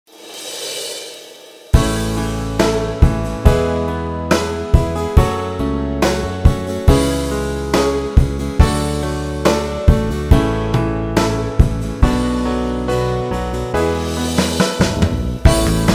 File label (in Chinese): 节奏流行摇滚 Pop Rock; cubase 编曲助手